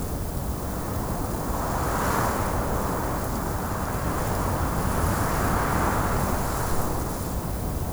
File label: wind howling